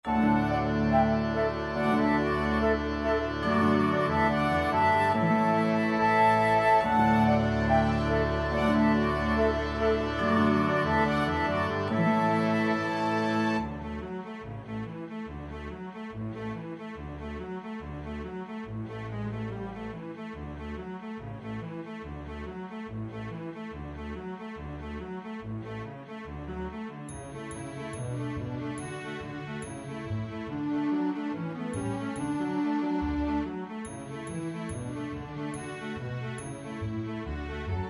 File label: orch